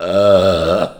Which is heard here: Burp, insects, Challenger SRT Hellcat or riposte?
Burp